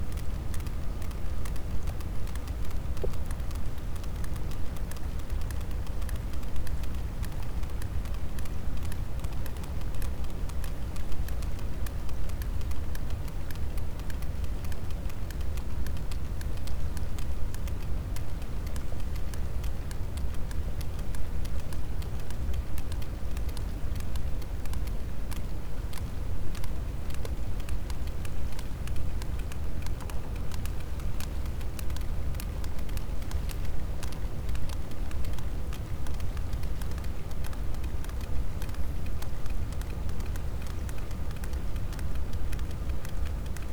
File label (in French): cachalot positif, Physeter Macrocephalus